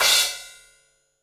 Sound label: DW Green Maple Cymbal Grab; 1999 DW Green Maple Kit; One-Shots; Sonic Foundry (Sony Creative Software); Vital Drums